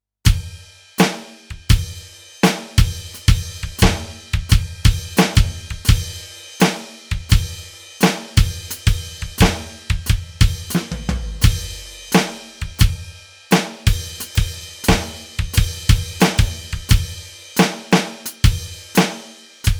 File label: Metal